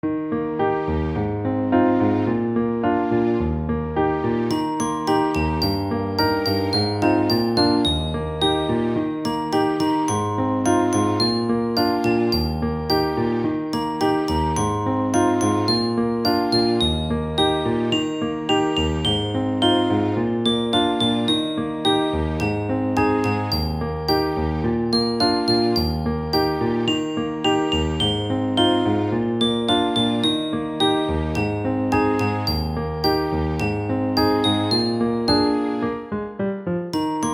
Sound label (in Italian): base musicale